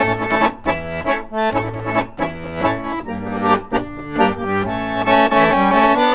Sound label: ACC, Balkanish Traditions